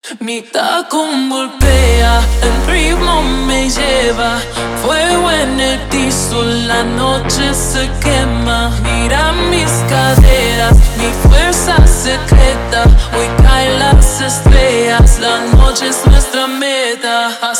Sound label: Super Slowed